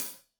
Hat Loose, Hi-Hat, Cymbals Stereo

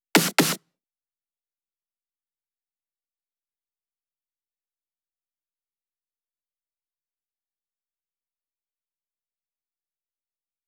128BPM SN Fill; Essential Clubsounds Vol.5; Let's Work It Kit; Root F#min; Drums & Perc

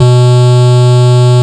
more bass B3; LIMAT LSDJ kit; LSDJ Extra Bass Notes

